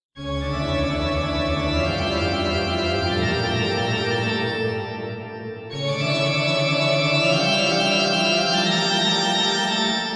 organ cathedral; reverb demo

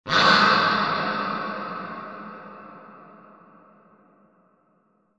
duck cathedral; reverb demo

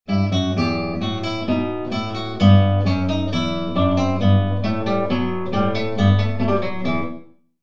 guitar classroom; reverb demo

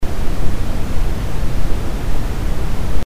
brown noise; noise demo

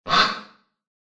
duck classroom; reverb demo

